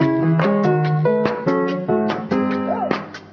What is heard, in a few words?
piano spanish, Loops; Phonky